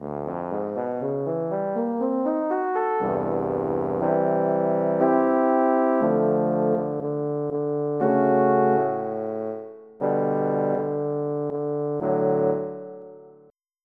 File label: Tuba; EverQuest